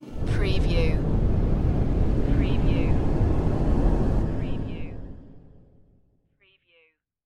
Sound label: dark wind blast